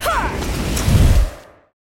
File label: Turncoat Caster attack